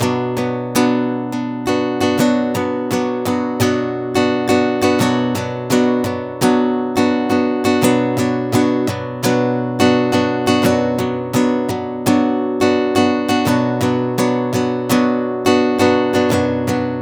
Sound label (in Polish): bicie w podziale szesnastkowym akord h modyfikacja, gitara